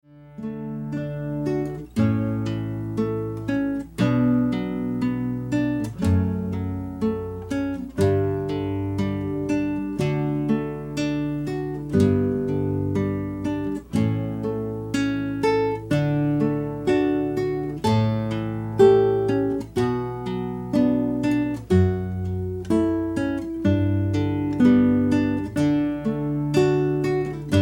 ceremony piece, Spanish Guitar Duo; Flamenco

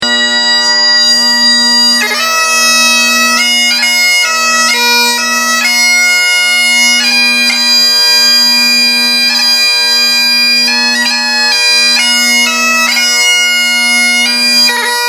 Great Highland Pipes, bagpipes; Jared Malone; bagpiper

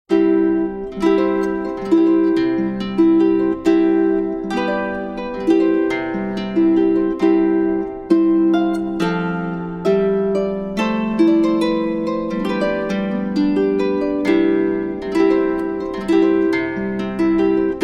Bridal Chorus, harp

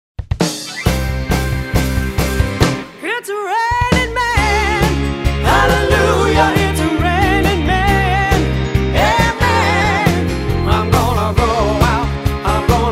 dance band

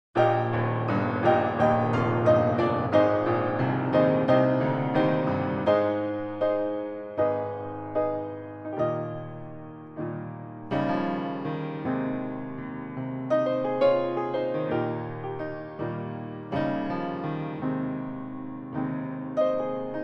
Piano

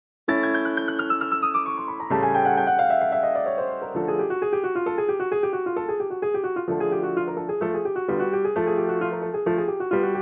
Flight of the Bumble Bee, piano; classical

